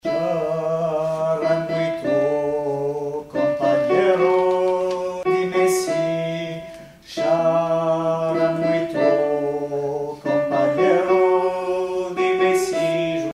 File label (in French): chichi ALTO refrain; chants